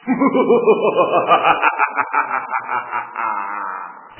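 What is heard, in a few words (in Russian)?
жуткий смех одиночный долгий мужской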